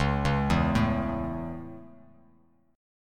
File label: C sharp Minor Sharp 5th down Bass Standard; C#m#5 arpeggiated; JGuitar